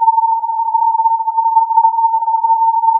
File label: filtered input